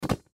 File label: Beach Ball Catch; Miscellaneous Sports; SoundEffects-Blastwave